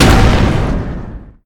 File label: whoosh charge; gundyr